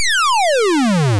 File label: fall; spelunky